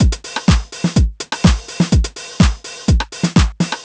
drum bust full 125, Classic Chicago House Drum Loops